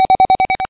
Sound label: scan; Deep Fighter